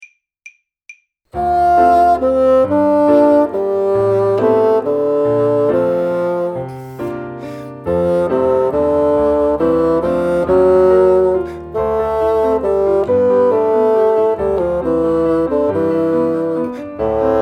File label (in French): anecdotes 2 basson